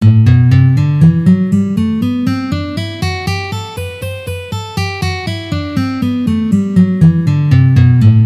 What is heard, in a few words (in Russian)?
Gamma Sol mazhor pozitsiya